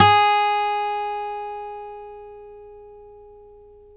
STEEL F G#3, Synclavier, GUITARS; Synclavier Sampler Library

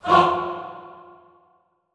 HAH F2, Classical Choir, SHORT HAH; Best Service

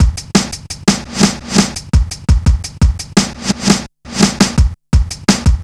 Drum Bass, Drumloops, 170bpm; Zero-G; Total Drum Bass

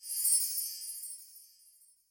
W CHim, Yamaha DTXpress-II, Perc

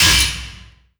Vinyl Oneshot; Sonic Foundry (Sony Creative Software); Esoterik Beatz

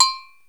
Bell, EMU Proteus

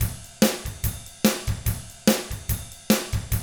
ROCK, Double Platinum Drums

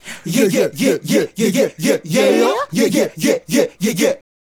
Male Group, Hip-Hop and RnB Vocals

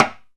lo timbale

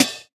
Closed Hat, JUNGLE BREAKS

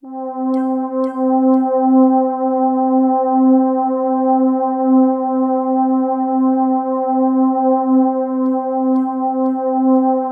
C5, Synth Leads; 90 s Sample CDs; NiceBeats (NiceBytes); Studioline 09

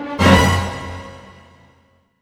GRO, Orchestral Loops; 128 HITCHCOCK

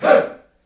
"HEY", Heart of Africa, shout

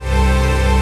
CHRD PAD; Best Service; XX Large Pads; Sample CDs

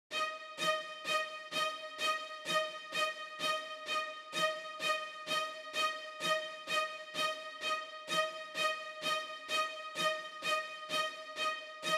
128 BPM Strings, Synth, Root G; Vengeance; Essential Clubsounds Vol.5; Demo Constr Kits; Big Line Kit

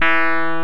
Electric Pianos

